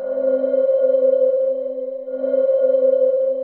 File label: BELL ATHMOS; ATMOS